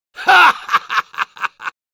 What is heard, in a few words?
laugh happy, es; Soldier